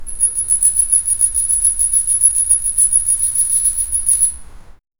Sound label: metallic medal; awards